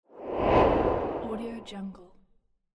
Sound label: exhale whoosh; transitions and movement